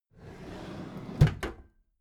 packed drawer smooth clatter open soft

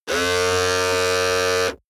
servo hum on off

